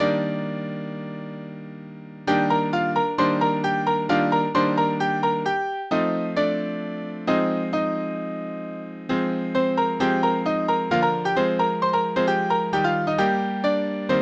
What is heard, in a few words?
dance (piano)